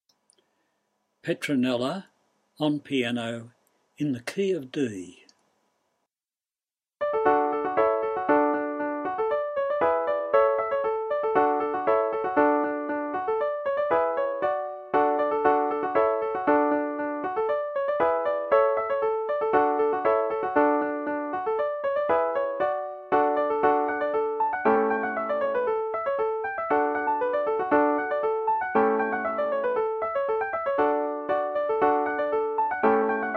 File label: petronella piano 115 bpm